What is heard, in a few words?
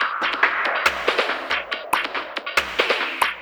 Loop 140 BPM; vengeance essential club sounds-3